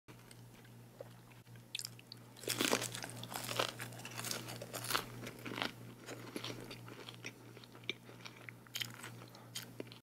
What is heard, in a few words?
french fries asmr; اسمر خوردن سیب زمینی سرخ